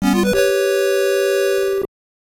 Jingle Level Up; Wizardry II Legacy of Llylgamyn 1989 nes

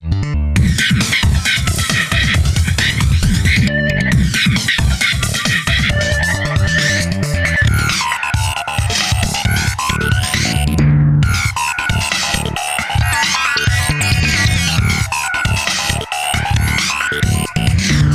Menu attraction

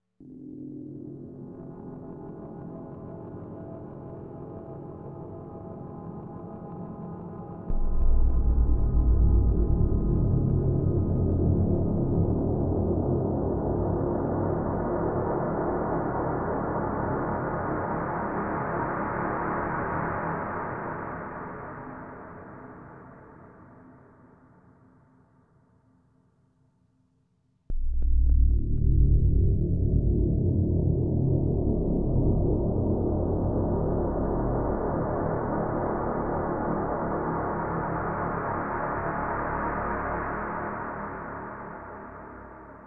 Prologue Ambience

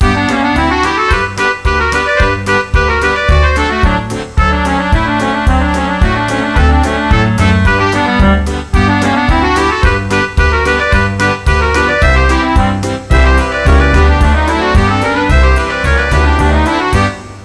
POLKA; Kid Pix Studio Deluxe

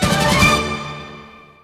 Jingle Race Win; Zenkoku Dekotora Matsuri; wii

